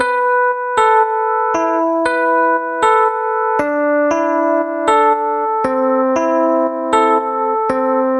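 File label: Melody 117BPM A minor 80s Classic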